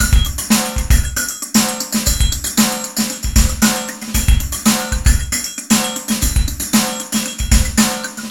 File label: PHASE PHOL 116, LOOPS